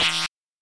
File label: Incorrect Buzz